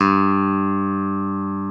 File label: CLAVINET G2; Best Service Advanced Media Trax Modern Composer